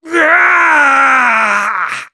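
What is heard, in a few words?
pain critical death, de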